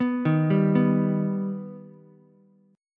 B Augmented B Ukulele, chord; arpeggiated; JGuitar

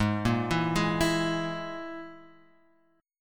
G sharp Suspended 2nd Sharp 5th, chord; x,x,6,3,4,3,6,x

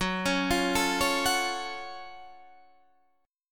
Gb Minor Major 7th Gb down Guitar Half Step Down

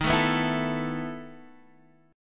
E Major 7th Suspended 4th Eb, chord

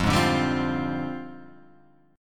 F Minor 6th Add 9th F down Guitar Half Step Down

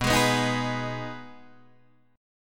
B 6th B down Guitar Open D

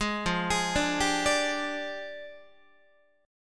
F Minor 6th Add 9th F down Guitar; JGuitar

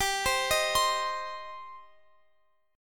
G Suspended 4th Sharp 5th, Guitar, Open F; Gsus4#5 arpeggiated; x,x,19,19,18,19

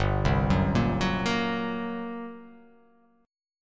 G Minor Major 7th Double Flat 5th down, chord; arpeggiated; JGuitar

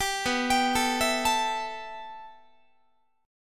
B 7th Suspended 4th Sharp 5th B down Guitar; B7sus4#5; arpeggiated

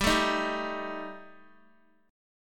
G Suspended 2nd Sharp 5th, Guitar; strummed

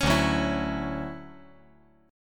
D-Major 9th D down

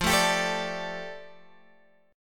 F 6th Add 9th F down Guitar Open G, chord

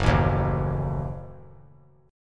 F sharp Minor 6th F sharp; strummed; JGuitar

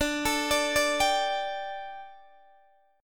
D Suspended 4th D Guitar DADGAD

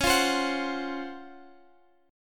C sharp Major 11th C sharp, Guitar Open F; strummed; x,x,13,12,15,13

